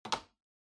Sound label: Portable Radio Put